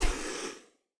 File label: Soft Slide Fast